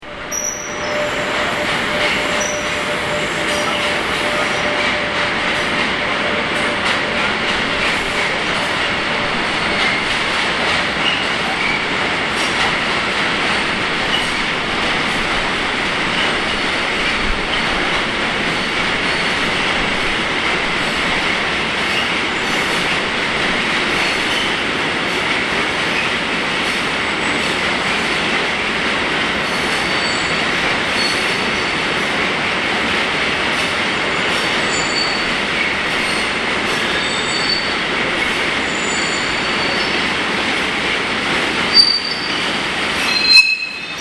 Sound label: machinery